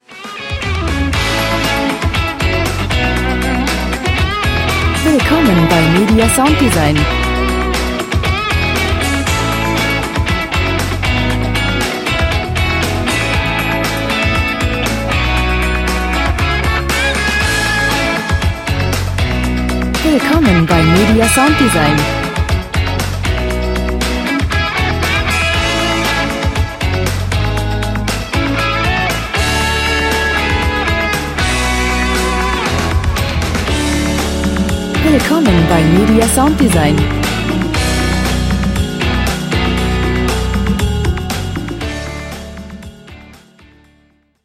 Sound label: rock